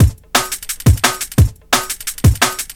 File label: DNB BREAK